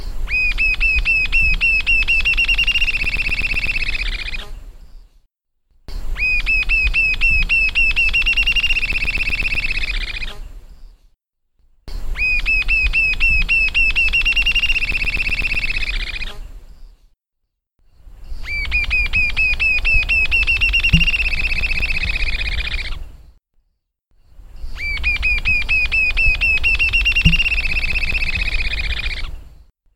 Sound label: jacamar